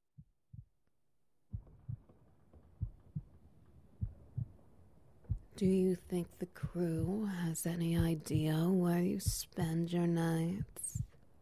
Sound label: Ambience, Heartbeat; The Captain's Best Kept Secret